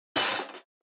metal texture

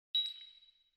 UI comform sound

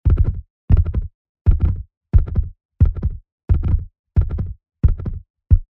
horse dart

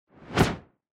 Whoosh

